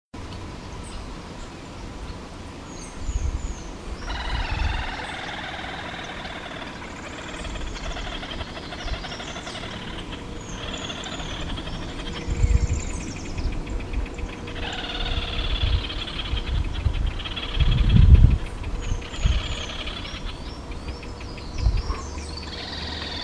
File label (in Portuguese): canto do mergulhao pequeno